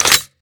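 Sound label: sniper first raise bolt release; gmod; iw8; xmike109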